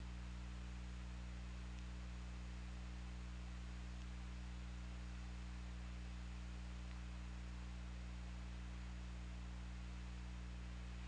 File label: Orca; OrcaLab